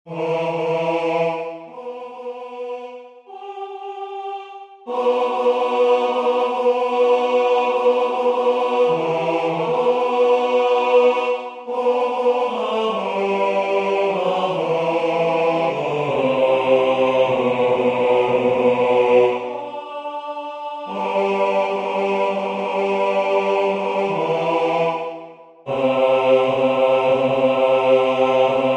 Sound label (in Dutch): Bas; H.Purcell